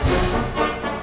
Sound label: Orchestral Loops; 128 VERTIGO